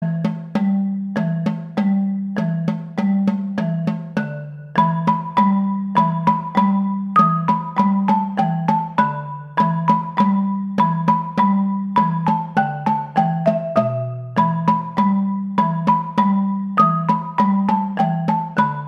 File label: marimba; SQUID GAME